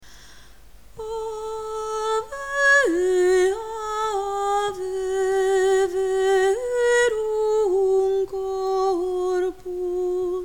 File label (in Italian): soprani; Ave verum corpus